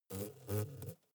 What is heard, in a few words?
hornet hum short